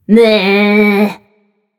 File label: tongue; smite; mulan